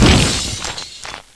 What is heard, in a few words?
Atlantean Egg Hatch; Playable Tutorial Level; TR1